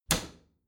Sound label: bathroom cabinet close heavy